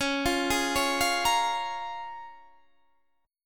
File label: Db 6th